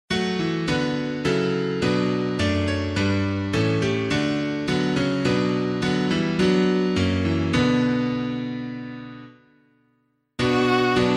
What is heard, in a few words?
piano